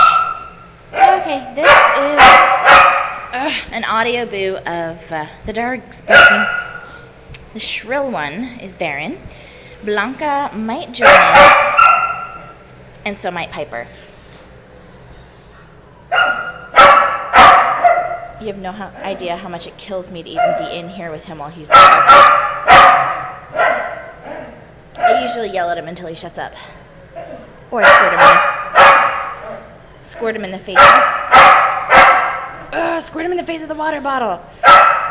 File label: barking